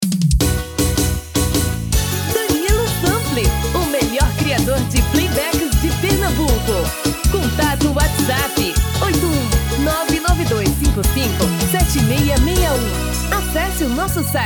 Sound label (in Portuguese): tom feminino